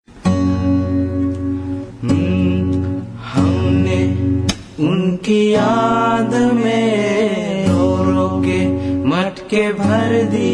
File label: Funny Hindi Song